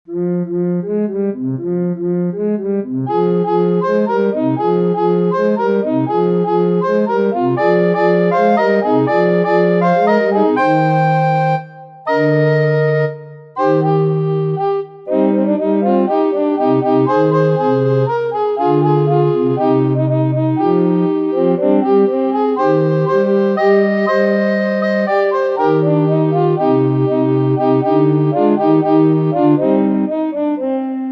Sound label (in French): flutes trio des balkans